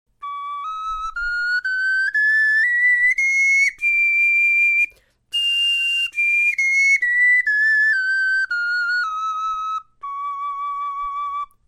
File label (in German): tonleiter, knochenfloeten